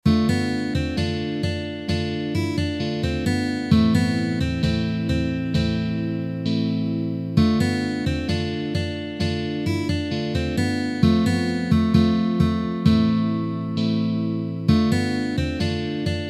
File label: Verse Slow Tempo